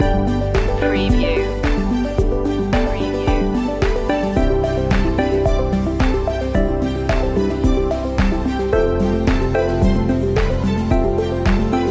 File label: corporate dreams loop